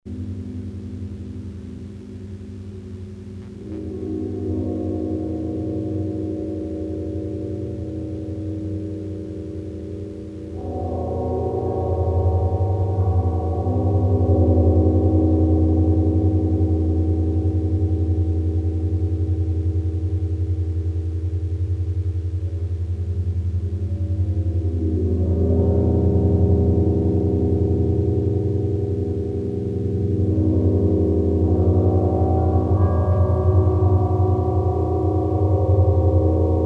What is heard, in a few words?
prepared aeolian harp balls; inharmonic series